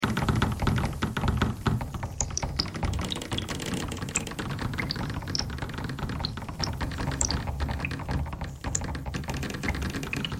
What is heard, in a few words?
bagpipe thread noise; bagpipeclick